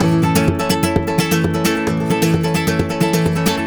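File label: 130 A rumba major, Spanish And Gypsy Traditions; Soundscan vol.59; AKAI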